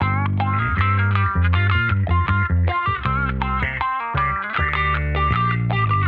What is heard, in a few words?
J Bass Strat Mix 79, Guitar Bass Mix; 79bpm; funk soul